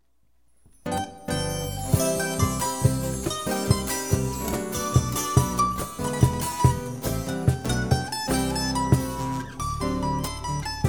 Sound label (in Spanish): canto bambucos